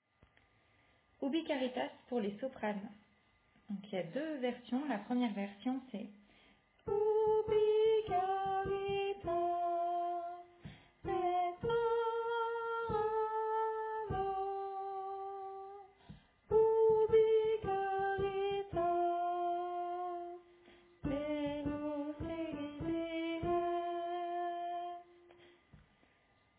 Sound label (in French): Soprane